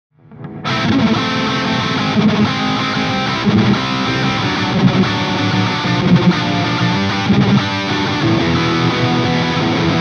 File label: HIGH plus BRIGHT; GRAVITY SPOT